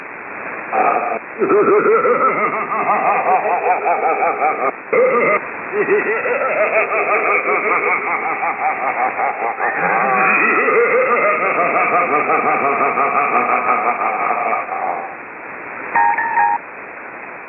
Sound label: evil laugh; Shortwave Pirate Radio